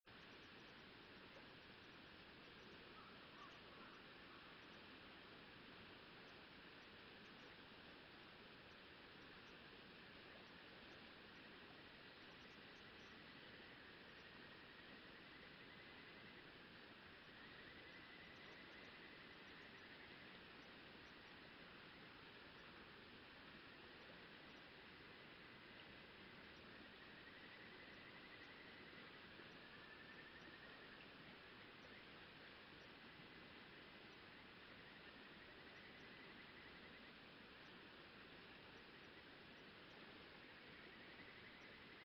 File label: riverside